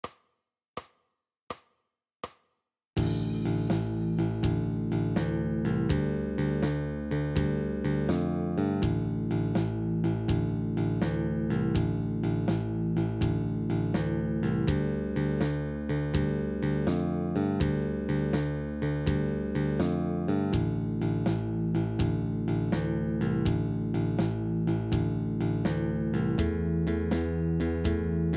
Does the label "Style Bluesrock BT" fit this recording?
Yes